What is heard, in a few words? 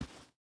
footstep boots sand walk; Rust